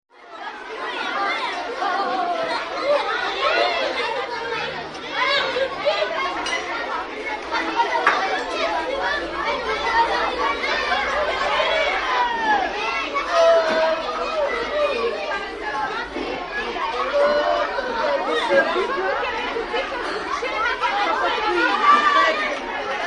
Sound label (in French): Repas Cantine